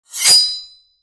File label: a sword sound, air